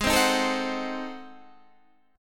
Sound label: Ab 7th Flat 9th Guitar Standard, chord